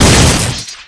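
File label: Explosion Electric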